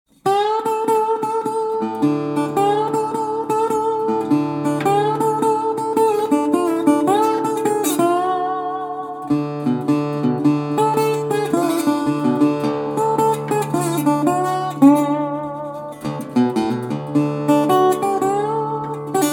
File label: Slide, guitar